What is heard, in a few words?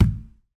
tyre fully inflated kick with boot; transportation; sound effects; sound effects one